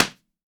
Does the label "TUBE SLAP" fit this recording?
Yes